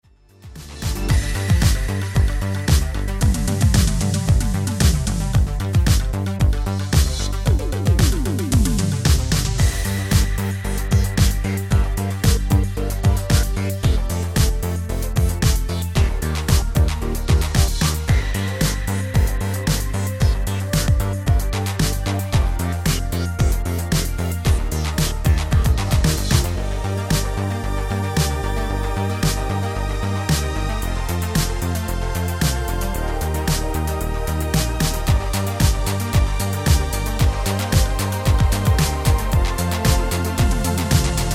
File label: dance instrumental